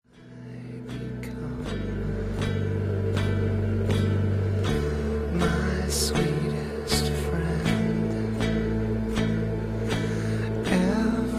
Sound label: Hurt